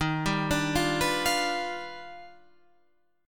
Eb Augmented Major 9th Eb down Guitar Standard; Eb+M9 arpeggiated; JGuitar

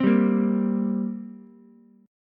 G Minor Double Flat 5th, Ukulele; strummed; 5,7,6,x

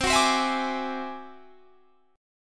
D 9th Flat 5th C, guitar

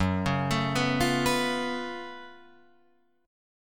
Gb Minor Major 11th Gb down Guitar Open F; GbmM11 arpeggiated; JGuitar

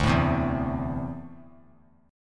D 7th Suspended 2nd down, chord; D7sus2 strummed

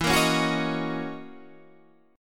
F sharp 13th E

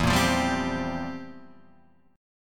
C 11th E

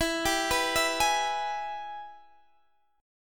Minor Major 7th Sharp 5th; Gsharp; E; x,19,17,16,17,16

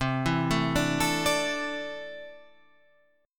C 6th Add 9th, C, down, Guitar, Half Step Down

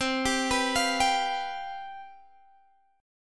C Major 7th Suspended 4th C down Guitar Standard; arpeggiated; capo=2

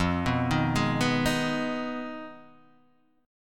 F Minor 7th Flat 5th F down Guitar DADGAD

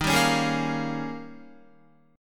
Eb 11th Eb, down, Guitar, 4F,4A,5C,5G,5C,6E; strummed; JGuitar

